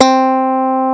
FUNK MID2 C4, DIGITAL BASS; Music Store - Claudius Diemeter Schwerlos

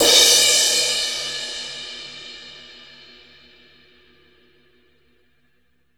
CRASH

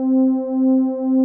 ANALOG PAD C4; Music Store - Claudius Diemeter Schwerlos

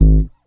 HEY HEY BASS; Sampleheads - Ultra Freakin' Bass

